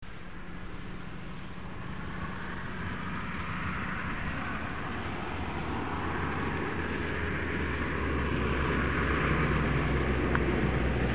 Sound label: Plane flying overhead